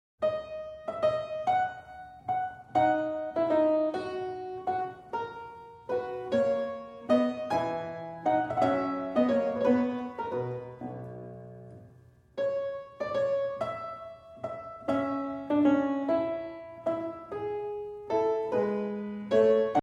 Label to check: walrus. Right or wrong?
Wrong